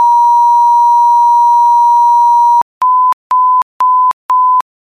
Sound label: signal